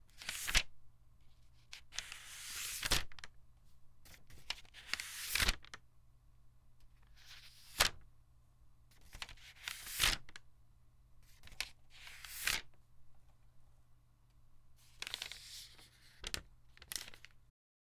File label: Pages Turning